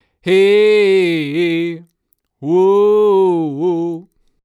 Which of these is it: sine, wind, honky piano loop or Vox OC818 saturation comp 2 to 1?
Vox OC818 saturation comp 2 to 1